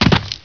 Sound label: jump big